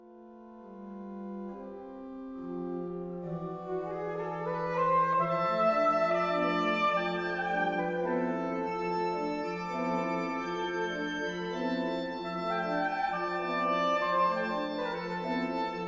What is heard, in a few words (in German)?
Sax Orgel; saxophon; Friedenskirche KS; Friedenskirche KS 08.09.2023